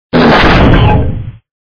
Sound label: hit; vapesquared